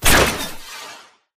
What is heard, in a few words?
atlas eject pilot launch; Titanfall 2